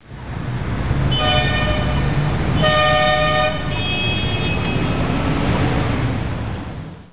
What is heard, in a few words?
amb